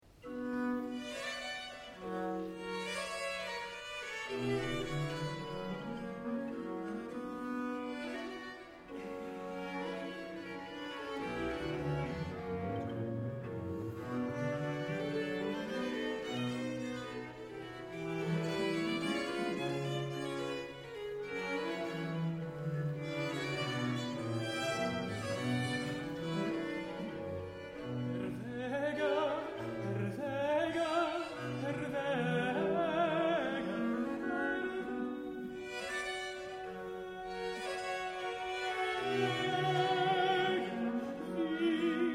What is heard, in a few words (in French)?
Tenor, Voix de l'opéra; Cartable musical